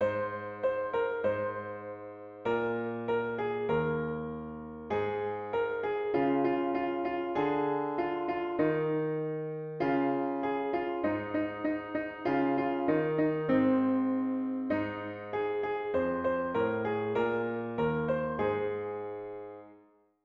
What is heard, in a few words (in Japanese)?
春よ来い（ピアノ）